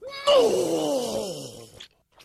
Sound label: angry noot